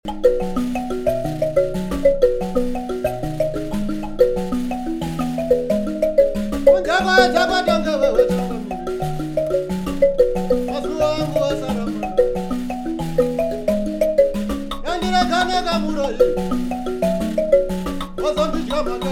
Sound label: mbira